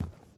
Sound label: foam floor walking; SFX; PlayerWalk